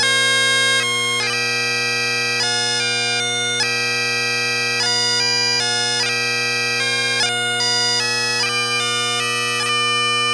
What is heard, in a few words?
Slow Air 6/8; PocketPipes